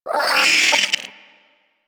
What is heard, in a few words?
Hyena Micro Vocal Angry; Warframe